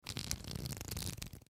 Cable Coax Bend, Cable Coax Bend Creak Stress; Creaking; Objects; Blastwave; Foley

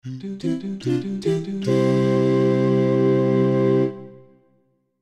Synth Vox Happy Crescendo; Synths; Musical; Blastwave; Sound Effects:Musical

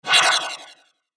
Whoosh Swirl Pan Delay, Whoosh Ascend Delay; Blastwave

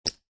Poker Chips Dropping On Playing Cards; Poker, gambling, chip

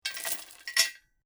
Metal Chain Drop On Ground

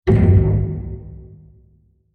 Deep Sci Fi Impact Blast; Impacts; Blasts (Sound Effects:Impacts); CraftedSignals

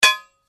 Blade Heavy Moving Impact, Sword; Foley sounds for melee weapons; Swords; Combat; SoundEffects-Foundation1

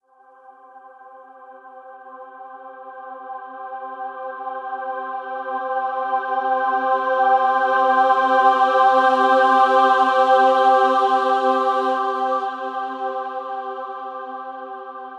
Atmospheric Choir Transition, singing

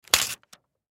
Note Pad Rip Page Out; Office; Blastwave

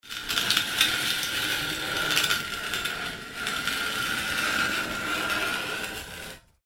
Metal Grind Scrape Large Rock Along Metal Bar; Long metal scrape sound made by dragging a large stone; Metal Sound Effects

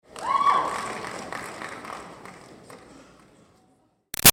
Crowd Teen Grad Scream Girl Short; Graduation; Reaction

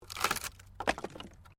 WOOD PLANK TWIST

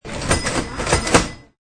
Cash Register Open, Cash register opens; Families inside candy store; Cash Register Sound Effects; Cash Registers (Sound Effects:Bars)

